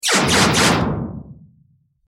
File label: plasma rifle shoots